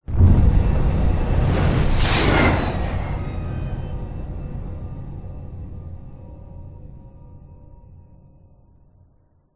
sound design cinematic hit whoosh whizz by long tail; zapsplat